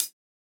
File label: japanese pop hihat